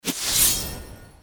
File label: opponent